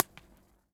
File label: Bridge, Impulse Response; Lions Gate; EchoThief Impulse Response Library